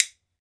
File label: WOOD BONE, Celtic Percussion